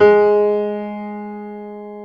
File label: G#2, GRAND PIANO; InVision Interactive; Lightware VOL-1; Instruments & Percussions